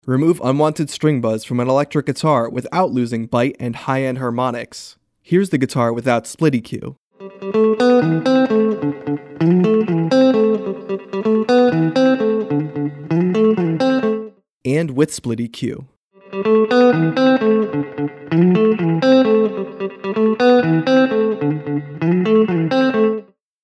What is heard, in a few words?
Repair Guitar; ギター; SplitEQ